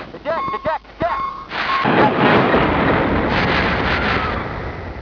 EJECT; VIETNAM

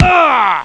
SCREAM

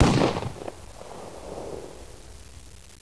TANK MISS

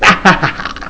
HAHA

